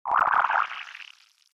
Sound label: tone